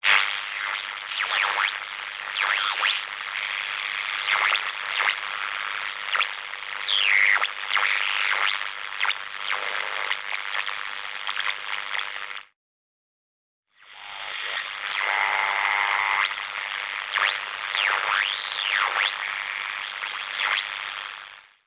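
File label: Radio Static Walkie Talkie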